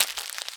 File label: Crisp Packet, household rhythm samples Hits